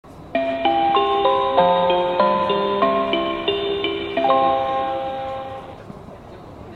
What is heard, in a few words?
chime; keihan